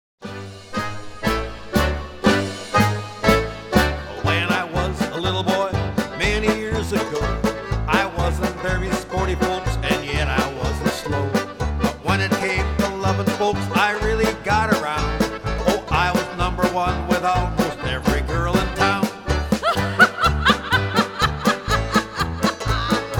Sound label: Laughing Polka